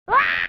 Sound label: death sound; bomberman